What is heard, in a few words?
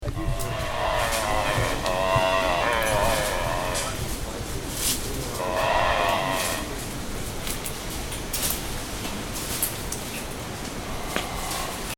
Abbott's booby, پرنده; بوبی ابوت